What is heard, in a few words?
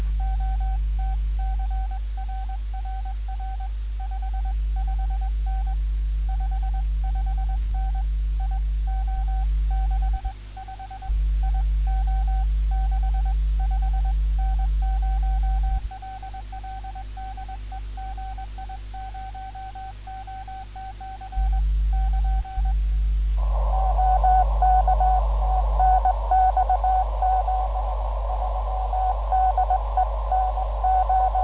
cw; N0LL; 6M; GI0OTC 50Mhz, VHF/UHF, HF Utility and Military monitoring